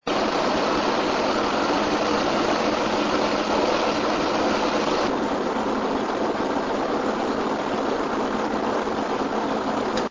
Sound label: pc quieting